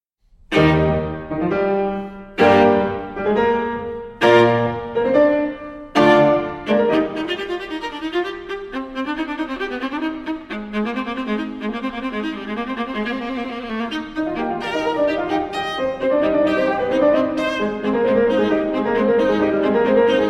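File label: viola sonata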